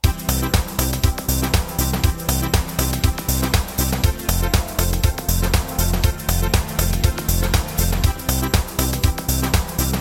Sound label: House